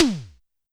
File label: Snare Synth, YAMAHA RM1X